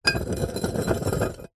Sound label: bottles x2 beer full movement scrape on concrete; foley; zapsplat